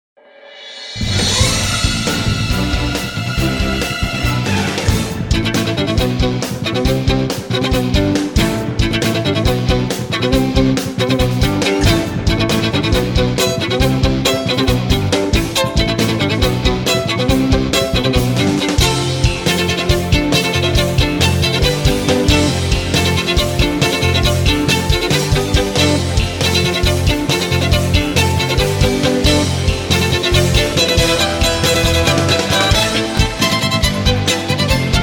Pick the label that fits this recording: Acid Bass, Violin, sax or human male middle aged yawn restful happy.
Violin